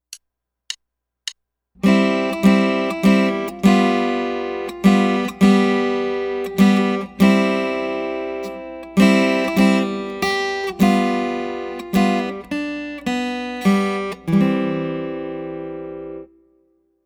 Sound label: Chording Along